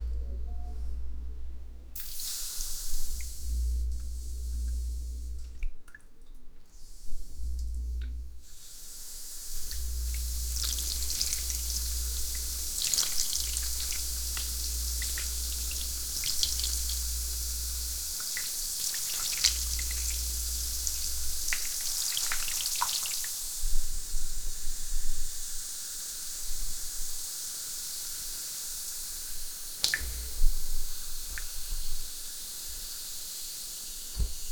asmr of gentle hair washi

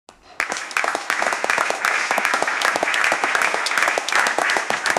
generate a 5 second sound, crowd

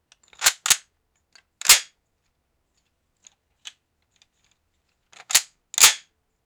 holstering gun gauntlet

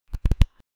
gentle card flip

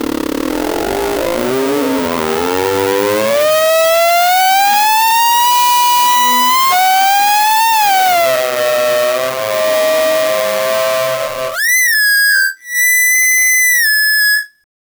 electronic keyboard toy; music

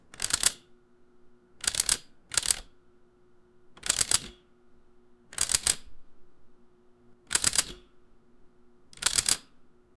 thick chunky satisfying, chunky

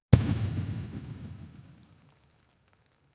quick vine boom in a comedic scene

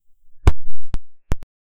jump sound; animation

